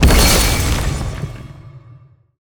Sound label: Enemy Boss Break Shield; bloom minigame